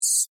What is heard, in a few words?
Insect Voice Scream, Vocalization, Bug; Animal